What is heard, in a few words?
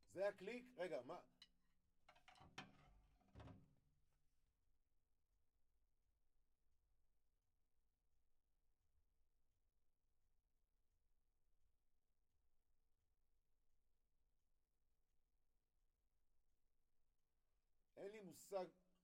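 Kit, drums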